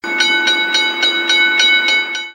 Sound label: ding; monsterz